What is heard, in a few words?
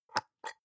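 soap dispenser press almost empty soft, bathroom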